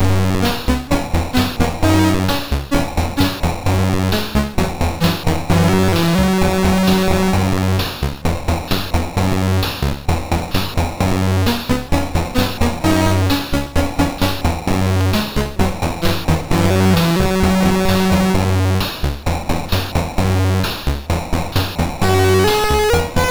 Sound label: Synth Sample, MOS6581R3